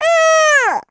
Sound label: Classic Hit Sound; Jolly Battle